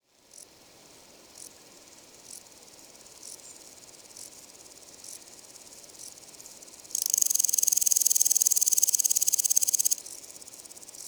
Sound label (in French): deux males de criquets noirs ebenes pres d'une femelle criquets duettistes; le Puech